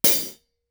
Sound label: hihat shoulder ff; Percussion; Cymbals